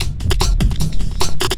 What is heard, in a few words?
LOOP, Drum & Bass, 150 BPM